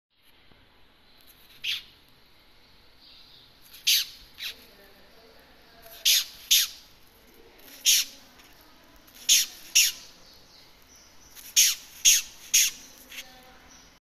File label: Polyphylla olivieri; کرم سفید ریشه